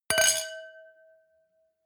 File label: wine glass similar clink bounce hard; berufe; gastronomie; soundpool